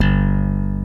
thumb bass; Greytsounds VOL-1

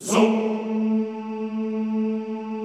ZOOMMM A2, Classical Choir; Best Service; Extended Classical Choir

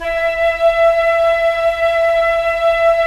Flute, Roland LA Composer; Roland - LA Composer vol. 4; 90 s Sample CDs

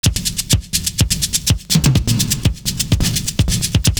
BD N SHAKERS, loop; Univers Sons - Kitch 70s

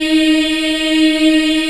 D#3 CHI IH-L, Choir; CHILD IHF

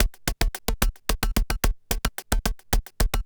Industrial Beats

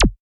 808 & 909 Kit ZAP; Roland Juno-D

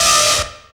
FX Dance; Sound & Vision; Gigapack II CD 2 (Roland)

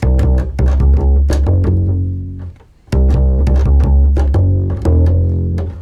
Double bass G# 165bpm, Bass Loops; Total Drum&Bass

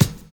N.Y FILL; Univers Sons

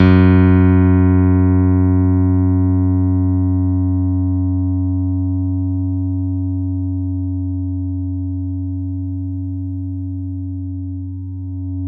F#2 F, Piano cp80

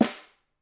SN, Percussion

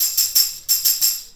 Tambourine

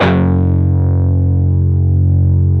PRS PWR G2E, Guitars; PRS PWR CUTS

